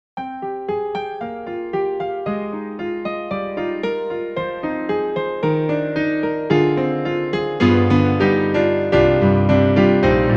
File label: Piano Duel; Roland; SRX-03; XV-5050